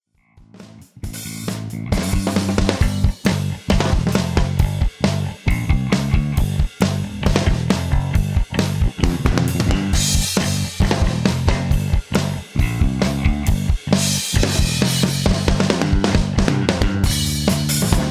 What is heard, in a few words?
Drums And Bass